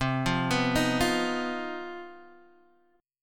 C Minor Major 7th Double Flat 5th chord, Guitar Half Step Down